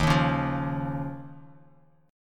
D sharp 7th Suspended 2nd, Bass Standard; strummed; JGuitar

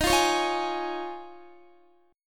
D sharp 7th Suspended 2nd Suspended 4th, Guitar; D#7sus2sus4; strummed

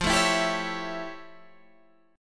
F Augmented Major 9th, Guitar, Half Step Down

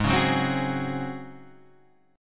Db Major 7th Suspended 4th Ab

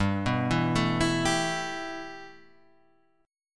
G 6th Add 9th G Guitar Standard, chord; 3,2,2,2,5,3; G6add9 arpeggiated; capo=2

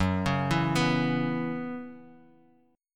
Gb 7th Suspended 4th Gb down, chord; Gb7sus4; arpeggiated; JGuitar

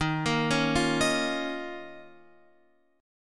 Eb 7th Suspended 2nd Eb down Guitar Open F; Eb7sus2 arpeggiated; JGuitar

